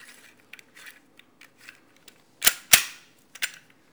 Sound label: Gun Handling Weapon, Weapons Foley; SFX Kit